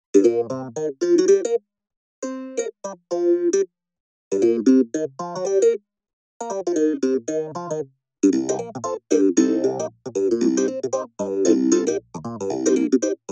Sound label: clav tubey